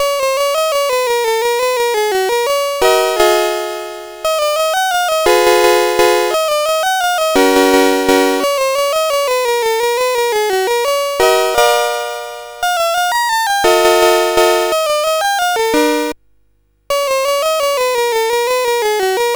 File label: Walzer, sid CSG8580R5; Music Shop